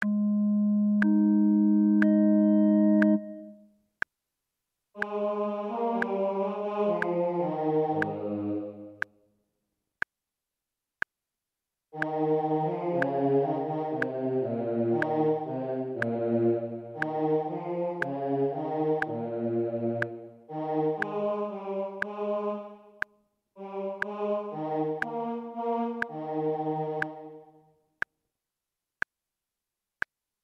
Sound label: O clap your hands; Gibbons